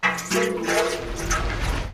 gastank; Quentin C's Gameroom

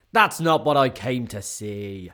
Boo; Pitch Black Audio Pong

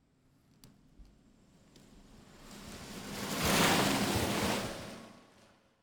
Automobiles various

